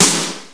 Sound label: SD Rock L; GMPlay; Drums; XG